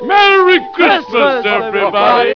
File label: beat xmas